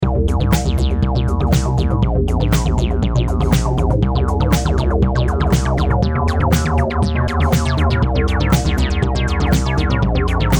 instrumental

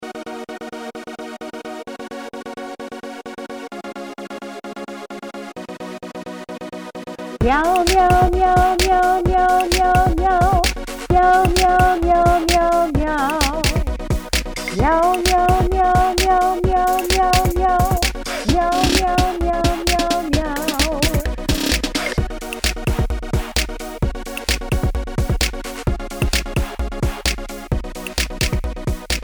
Meow Meow

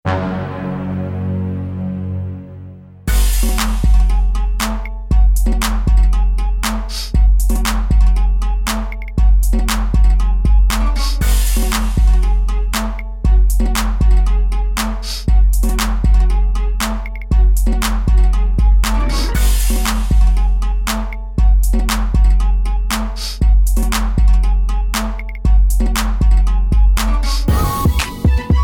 instrumental

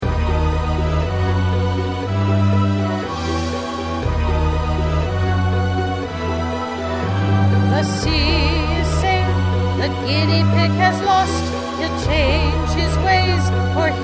vocals

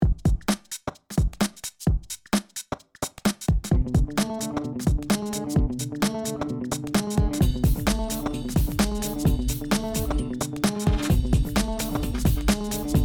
instrumental

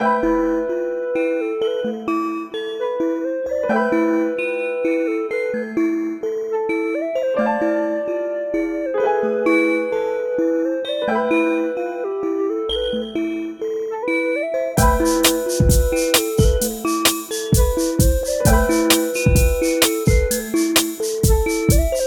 Clouds instrumental

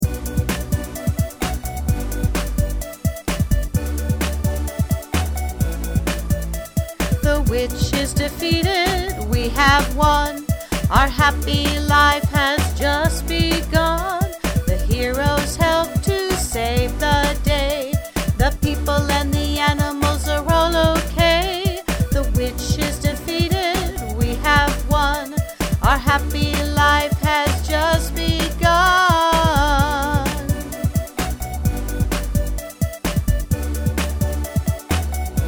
vocals

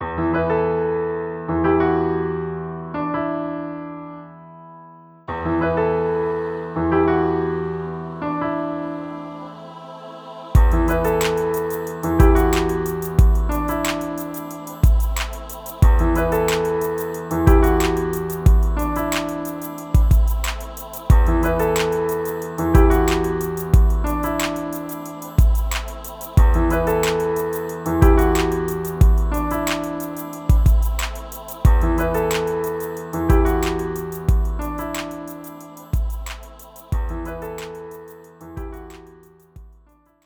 Rain instrumental

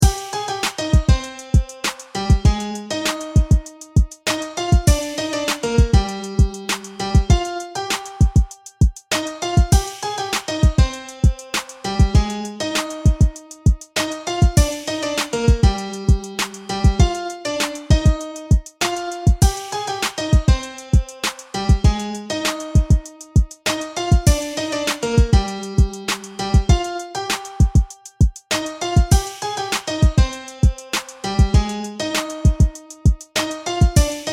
instrumental

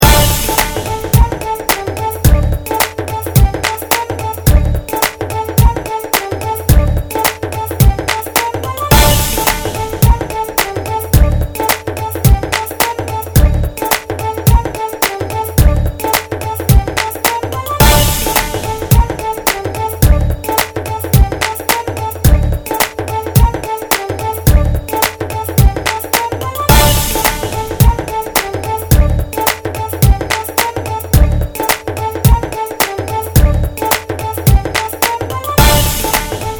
song instrumental

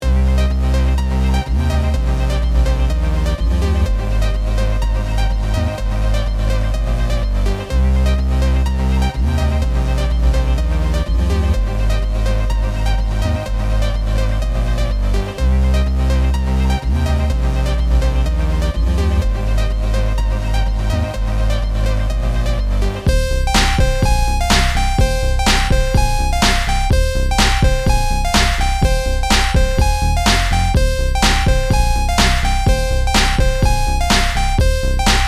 instrumental

